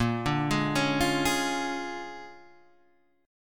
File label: Bb 7th Sharp 9th Bb down Guitar DADGAD; Bb7#9 arpeggiated; JGuitar